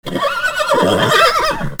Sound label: ANIMALS